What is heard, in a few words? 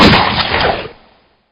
gun shot; Crash Bang Boom